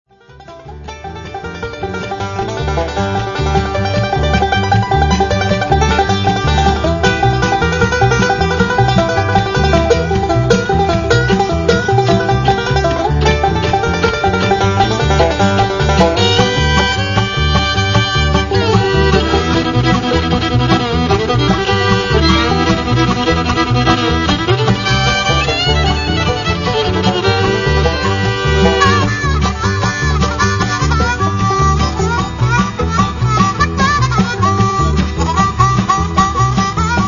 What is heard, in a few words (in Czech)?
Banjo